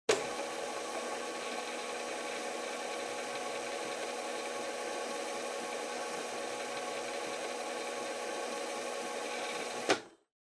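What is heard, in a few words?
Portable Stereo Driving Noise Tape Rewind Stop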